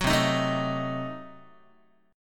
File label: C Diminished 7th down Guitar; strummed; JGuitar